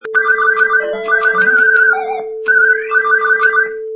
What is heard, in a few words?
Video Game Sound